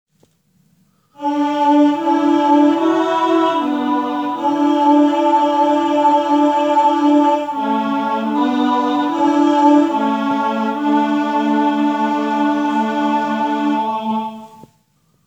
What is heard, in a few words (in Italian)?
alleluia iniziale contralti